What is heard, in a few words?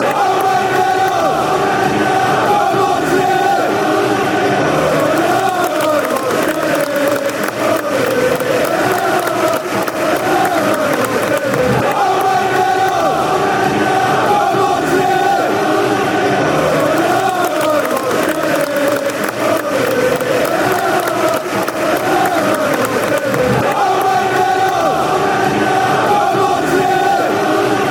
go marching, fanchants